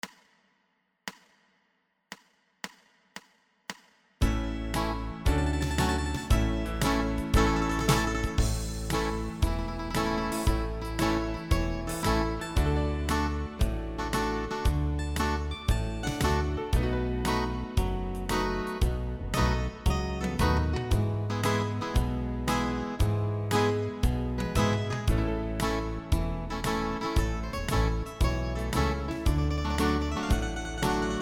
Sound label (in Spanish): karaoke